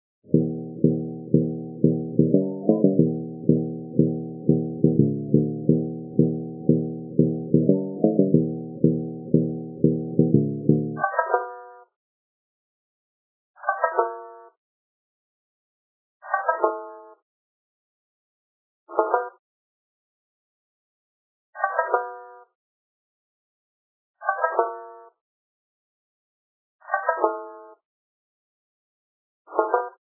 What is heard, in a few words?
more sticks